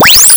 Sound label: TELEPHON